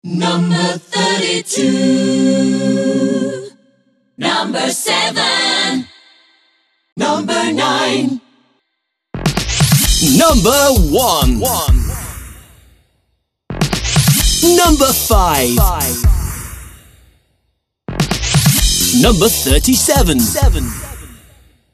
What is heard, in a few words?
70s pop style show countdowns; Cabaret and gameshow intros